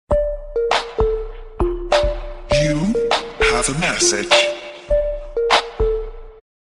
hip hop sms ringtone

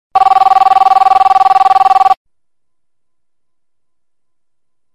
motorola grave sound ringtone

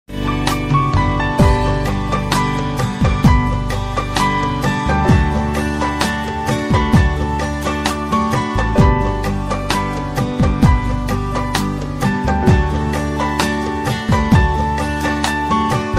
bollywood instrumental ringtone

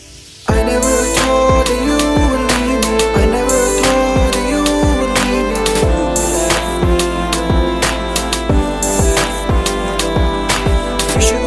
hindi music ringtone, bollywood ringtones

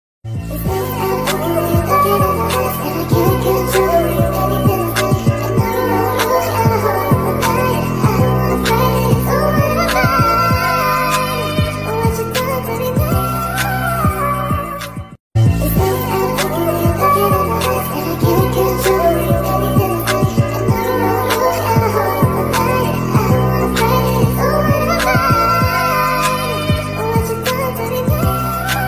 lovely romantic english song tone ringtone

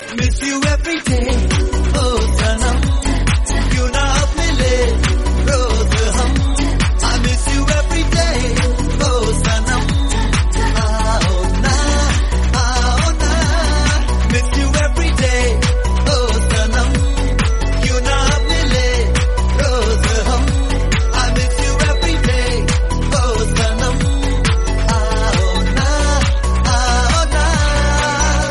bollywood song tone ringtone

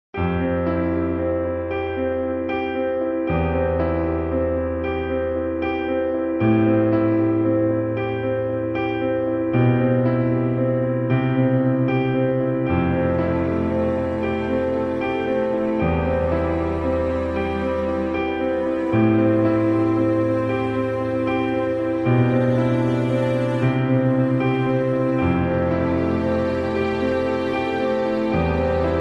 galaxy s9 deep blue ringtone

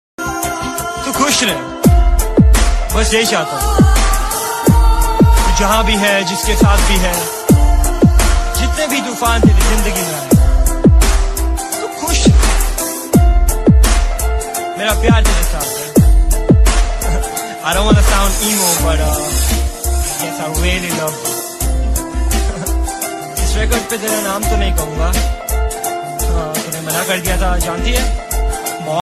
bollywood love sad ringtone

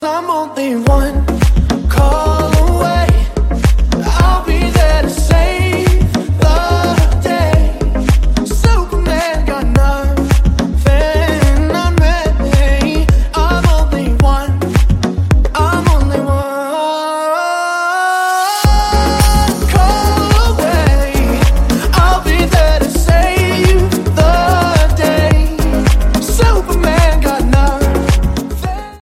remix ringtone

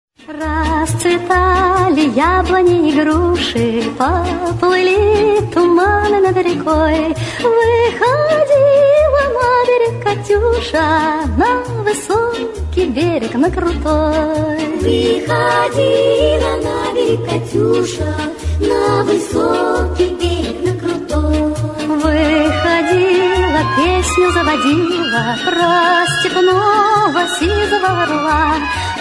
famous russian song musical tone

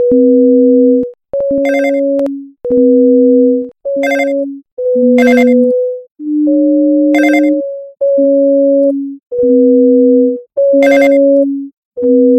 clock alarm ringtone

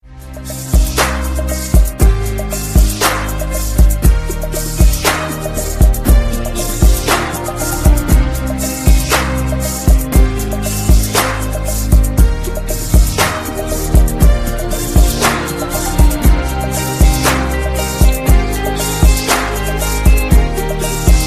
fabulous rock ringtone; samsung ringtones

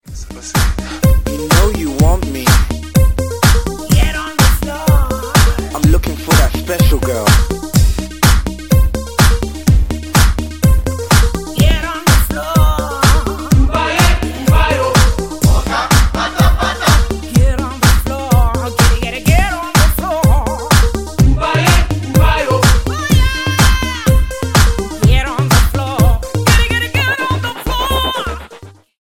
club floor ringtone

